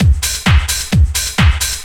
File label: drum 130 dance, drumloops